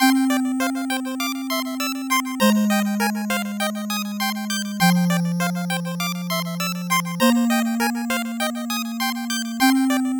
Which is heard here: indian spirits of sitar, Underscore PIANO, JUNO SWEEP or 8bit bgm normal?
8bit bgm normal